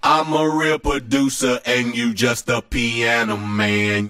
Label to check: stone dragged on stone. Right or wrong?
Wrong